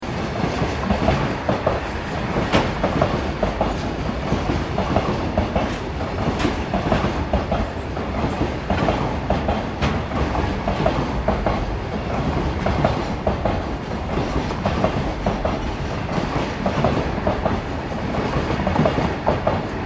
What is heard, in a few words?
Train Aomori Rolling Stock Exterior Moving Loop, Japanese Trains; Vehicles